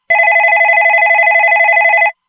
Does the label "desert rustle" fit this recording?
No